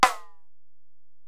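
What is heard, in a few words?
Acoustic Percussion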